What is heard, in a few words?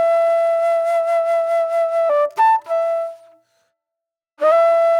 Pop Flute